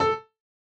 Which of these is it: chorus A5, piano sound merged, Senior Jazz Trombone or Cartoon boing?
chorus A5